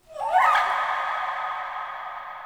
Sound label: Day Pixies, Ambience; Glades Of Andurin